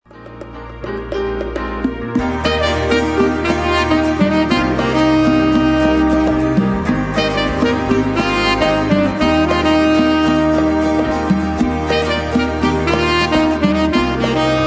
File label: cornfield samba